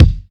original kick single shot D sharp key, Royality free kick drum one shot tuned to the D# note